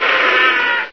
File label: controller pain; source engine; hl1; video games